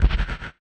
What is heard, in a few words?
Perc, drums; One Shots; Percs; Touch Loops; BlissedOutBeats